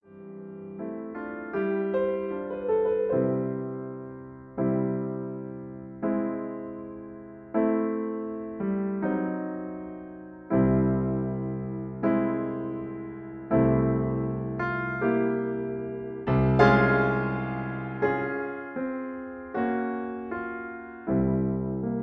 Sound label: Accompaniment F